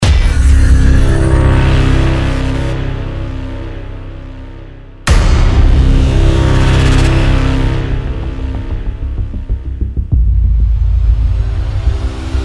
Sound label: music